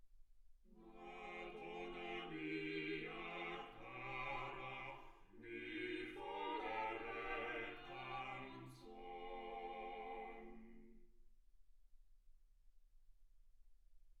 quartet

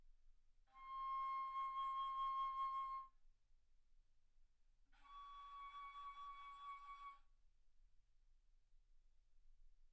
Bass Flute C6 Db6

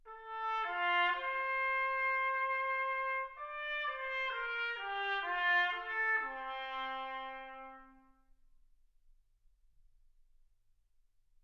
trumpet; single mic

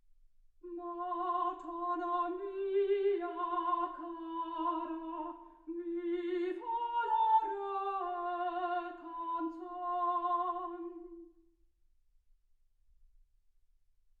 soprano

